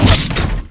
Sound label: fall; Alien Arena; megaman